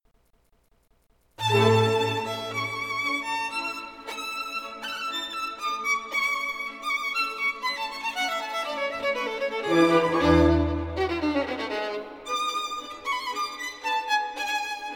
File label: Violin Con